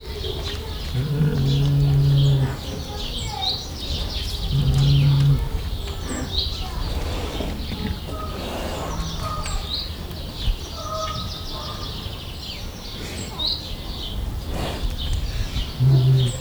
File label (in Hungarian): kisebb perpatvar, orvosmedve; debreceni zoo 2012; marantz puska